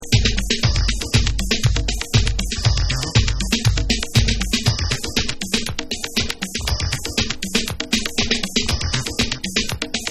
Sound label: Acid Dub